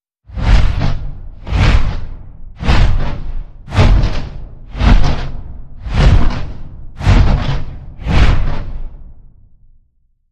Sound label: Flapping Dragon Wings